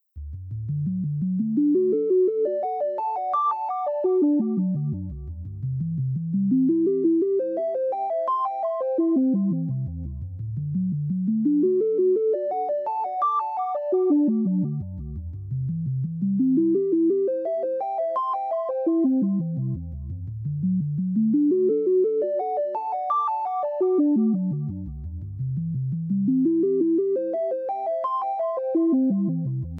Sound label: only melody